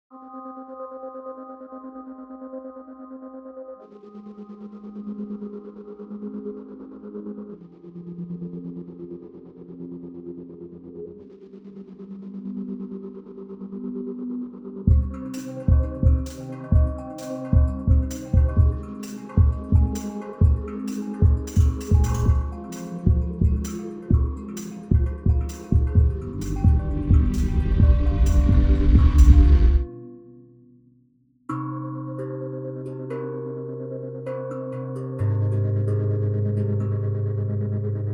tangent drum; audio brewers

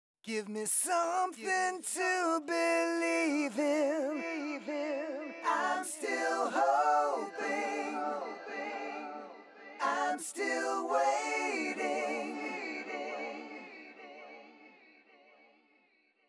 vocal 4 ducked tape

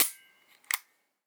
gun hammer trigger pul; nova

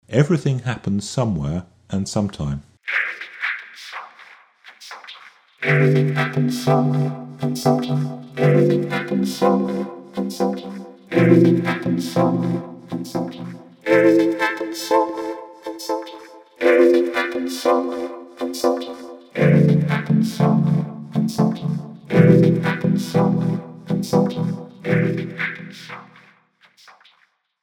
stereo vocoder

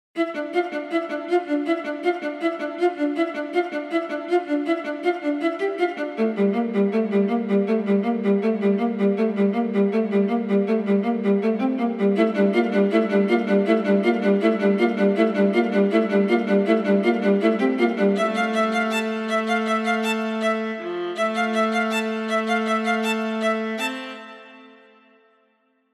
strings viola; captains strings

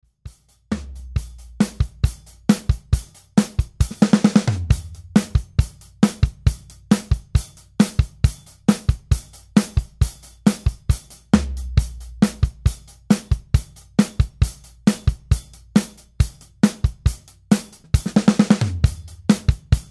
80s pop

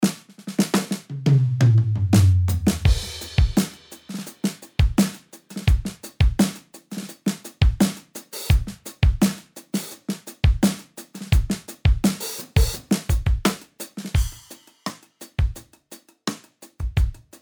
vintage standard, drums; handy drums

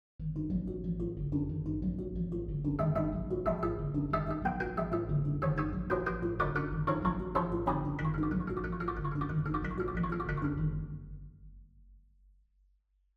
pitched example finger

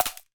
clapper

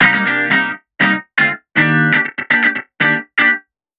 multi delayed

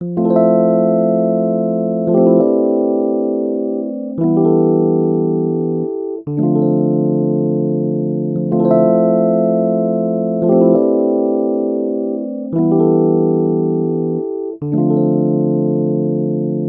fmaj7 neo soul 115bpm

